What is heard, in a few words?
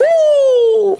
woo